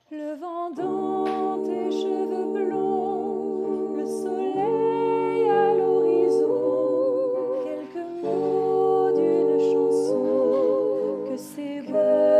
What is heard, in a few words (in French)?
c'est beau debut tutti